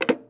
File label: Replacing Phone Handset